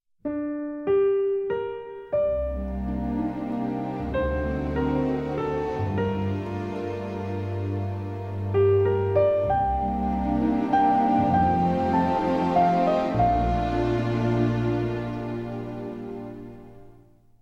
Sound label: heartbeat; Howls Moving Castle OST